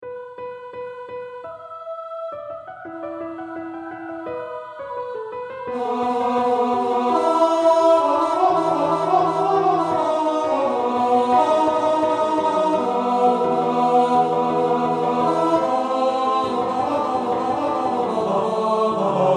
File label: Tenor; Propter magnam